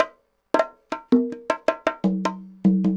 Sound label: cong bongs 80, latin, 80bpm